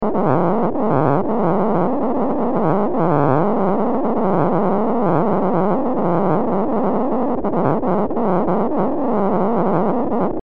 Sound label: Force Synth